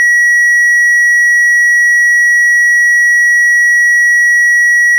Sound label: 1922hz triangle